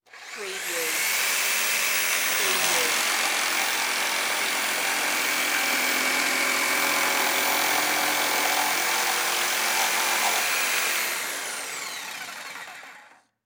Jigsaw Cut Particle; Sound Effects Bible

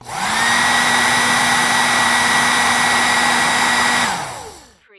Heat Gun Light Long

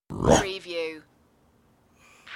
Evil Ruff

